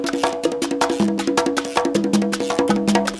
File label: PERCS LOOP; Crypton - DrumBase5000